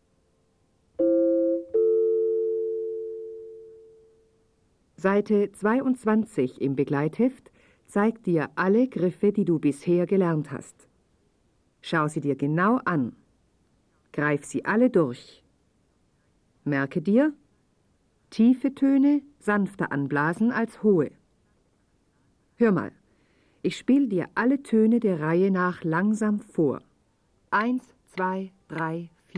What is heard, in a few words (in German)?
Spiel Blockfloete